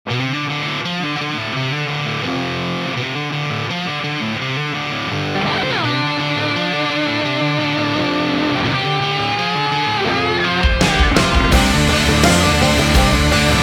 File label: Theme Country; Monster Jam Steel Titans